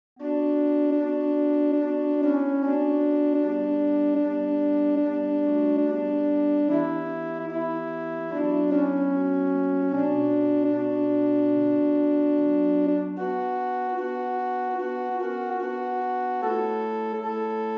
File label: Adsum Domine alto